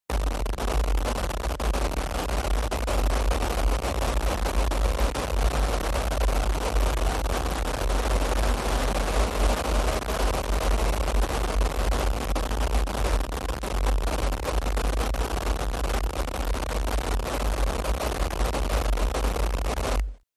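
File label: Noise Distorted Clicking Rip with low frequency effects; Noise Distorted Clicking Rip with LFE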